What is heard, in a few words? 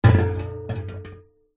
industrial metal canister large empty set down